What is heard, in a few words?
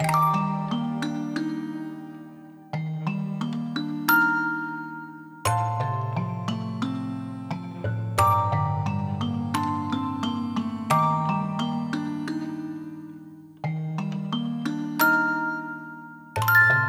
Music Box Loop; super perspective; Perspektif